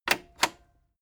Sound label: cassette eject click slow heavy